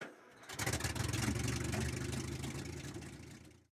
start engine, engines; World of Tanks

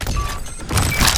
Rodeo Battery Beep; Titanfall 2

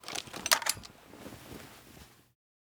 ak74u reload start; Rust

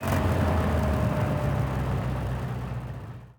engines; World of Tanks

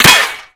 impact metal; Modern Warfare

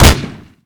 20mm chopper gunner; weap 20mm chopgunner player

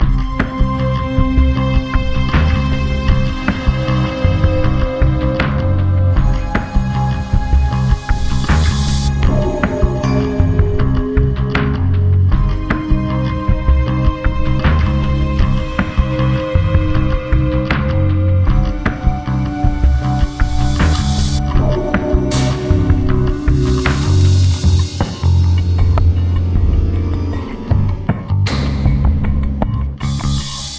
Music Fail; DCS

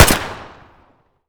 grach fire outdoor close; Escape from Tarkov

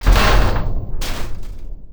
Damage Airframe Breakage Ex; DCS

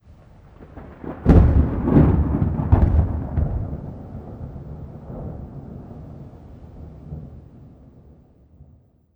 thunder med; Rust

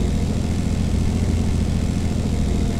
leo2a5 engine rpm load, tanks; engines; War Thunder

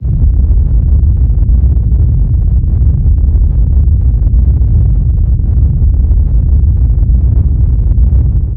ship engines; World Of Warships

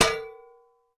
2handed sword strike; Rust

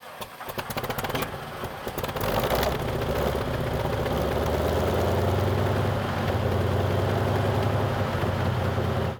start engine, engines; World of Tanks

